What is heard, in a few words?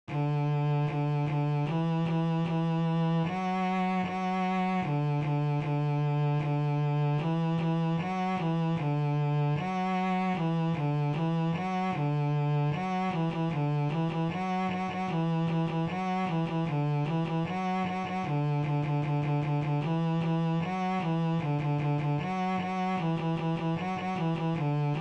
D string Mixed Rhythms, Bass; Bass for Camp